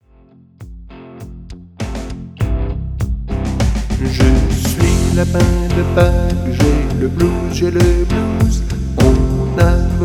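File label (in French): V1 chante V2 chante, Version vocale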